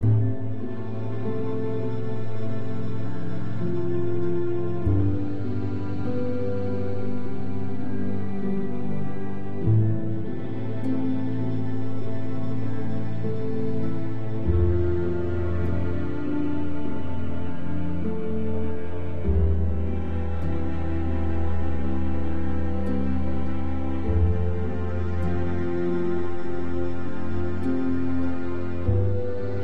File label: Loop Success; the walking dead season one